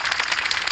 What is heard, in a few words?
damage; Dota 2; Phantom's Embrace